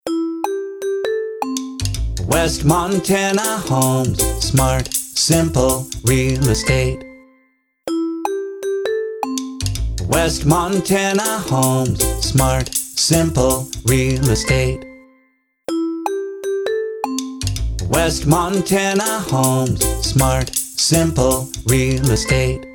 Homes Jingle, real estate company jingles; West MT Homes

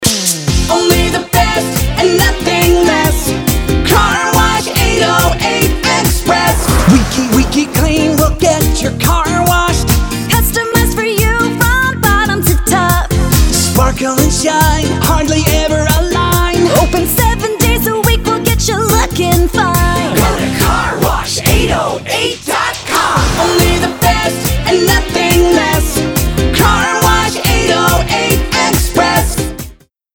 Car Wash Express Full Sing, jingles

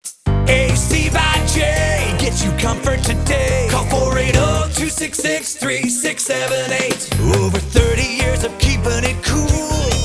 jingle, hvac heating cooling jingles; AC by JAY

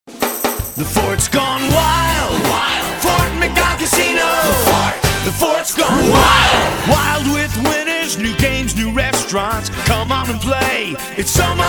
Casino WILD, casino jingles; Fort McDowell Casino

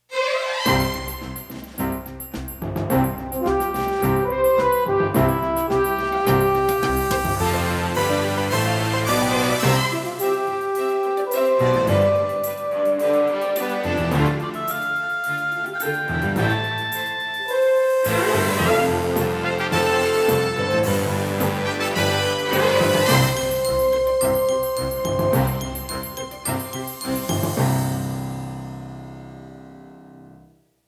Orchestrated; orchestrated jingles

